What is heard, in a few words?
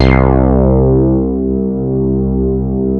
SH 1000, BASSES